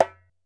ASHIKO, African Perc; E-MU Producer Series Vol. 7 – Old World Instruments

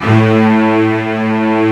STR SEC A1, Strings; STR SECT #2

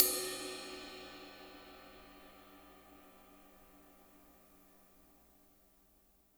ride, cymbals; Spectrasonics; Burning Grooves

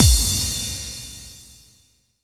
BD, Dance Drums; Best Service ProSamples vol.15; AKAI

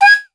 FLUTE, 160 BPM, Drum & Bass; Ueberschall; Drum & Bass Resonance

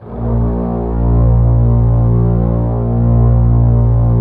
A#1, Violin, LOW VLNS; Q Up Arts - Denny Jaeger Master Violin Library

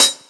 Hat, Hi Hats; Zero-G Sound Sense Grime Scene; GS Kits

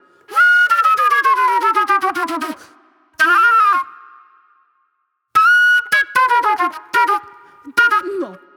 Raw Funk Flute

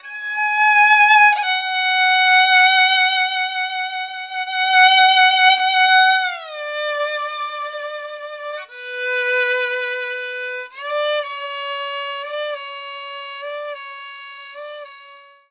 Violin; Sonic Foundry (Sony Creative Software); World Pop; Stringed Instruments

